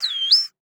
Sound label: Animal Bird Brown Headed Cowbird Chirp; animals; AdobeCC